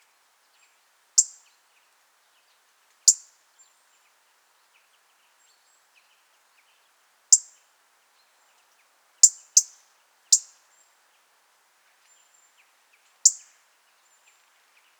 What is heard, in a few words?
European Robin Erithacus rubecula